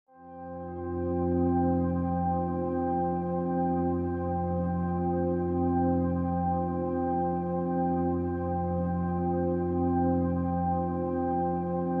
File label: ambient